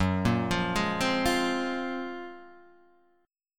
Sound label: Gb Major Sharp 11th, Guitar Half Step Down